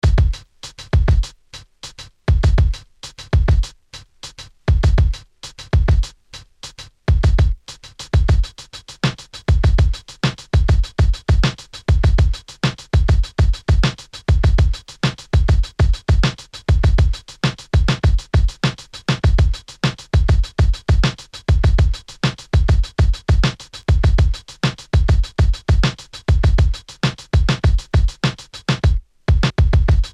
Belt Drive Breaks, Direct Drive Beat

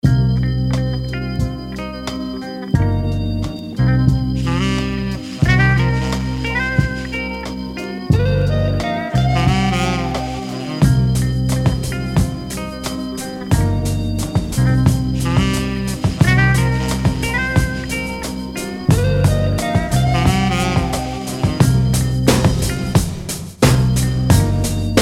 Blues

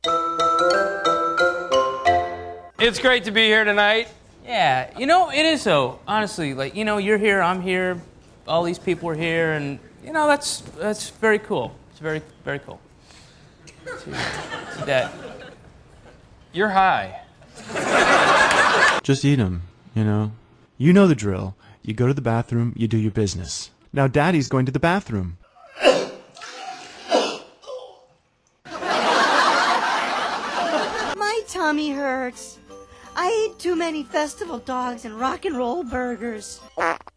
cuts beats breaks samples stuff; threepeeoh

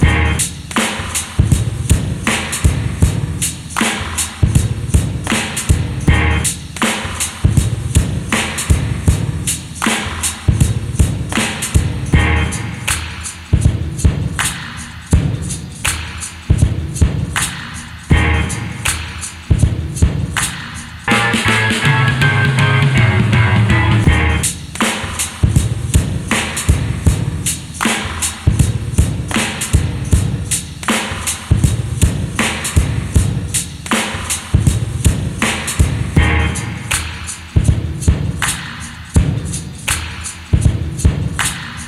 cuts beats breaks samples stuff; threepeeoh

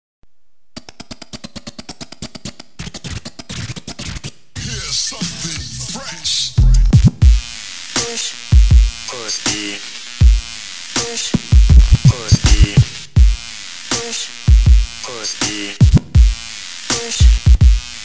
super scratch break record

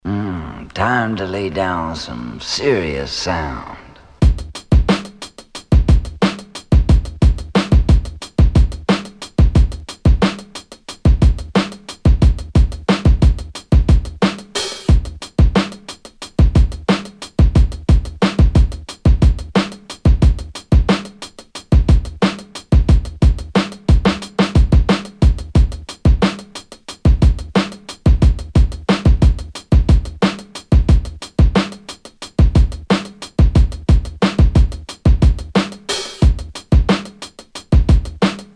Belt Drive Beat; Belt Drive Breaks

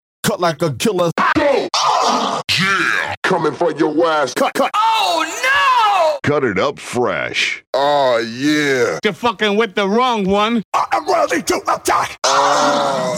Scratch Tool

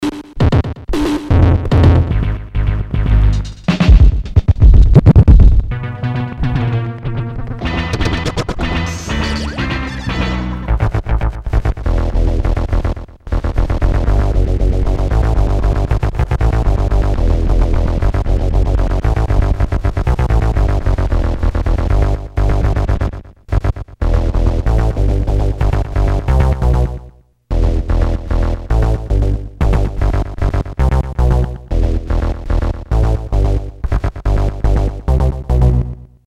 im great bass player

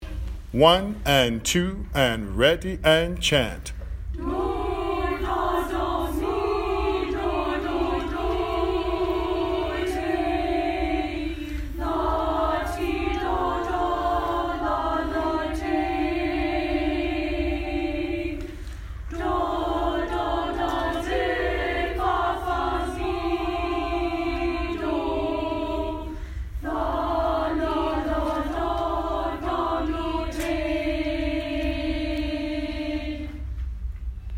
Chant Solfege; Whispering Hope

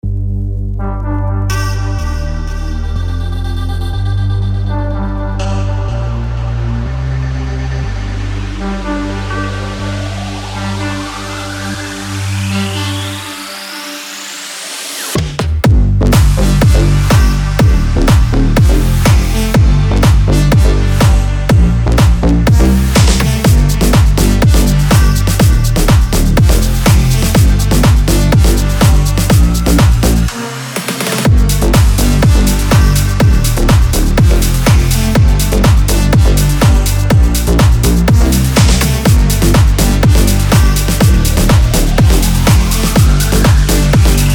Deep House Vibes; Big EDM

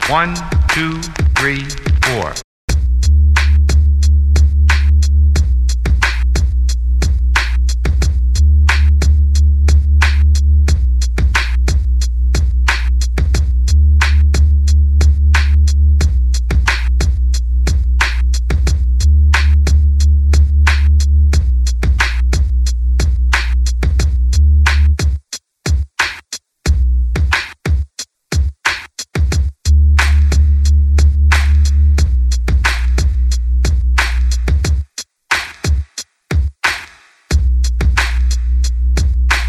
cuts beats breaks samples stuff; threepeeoh

